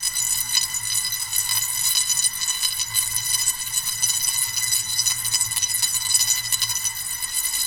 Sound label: Futuristic Electric Damage